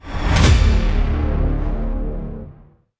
skin drop

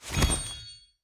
case drop; battles

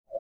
plinko fall in

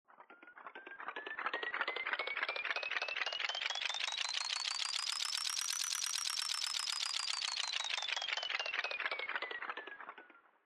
upgrade spin long

